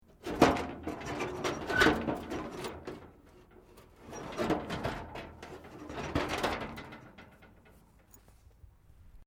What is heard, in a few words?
METAL CREAK LIGHT; Materials